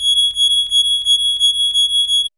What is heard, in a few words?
ALARM